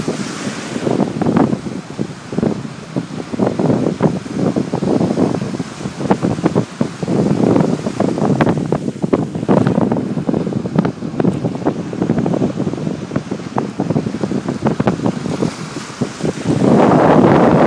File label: relaxing waves margaret river australia